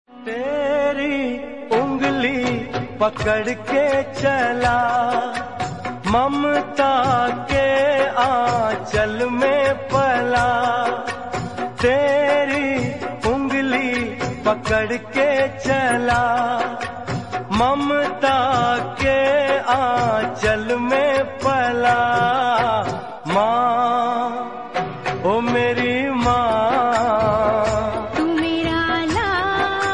Ringtone

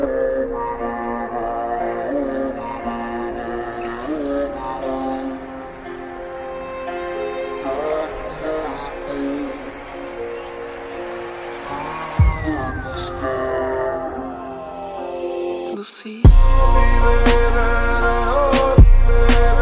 English Sad Ringtone

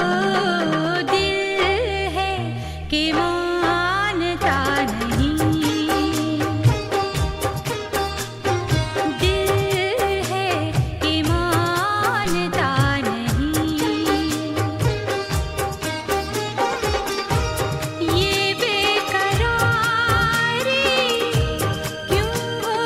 Ringtone Female Version

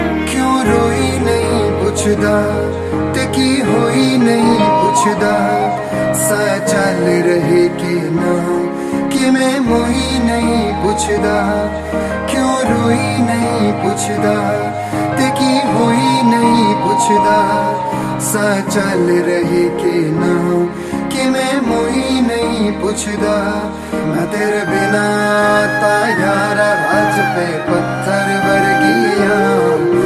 Punjabi Sad Song Ringtone

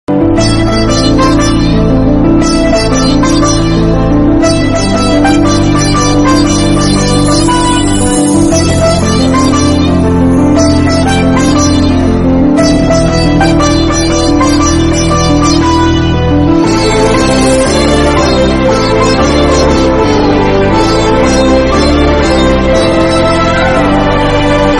Telugu BGM

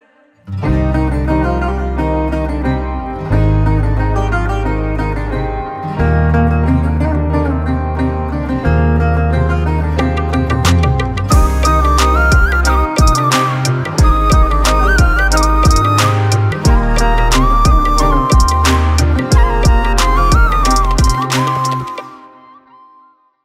Instrumental Tone

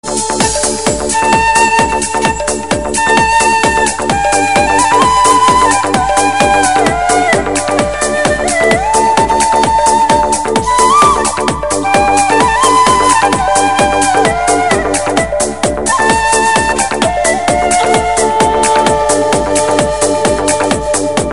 BGM; Bombay

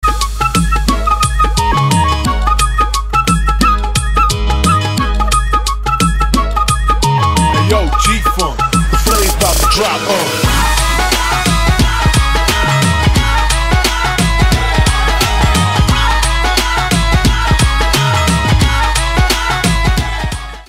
Instrumental Tone

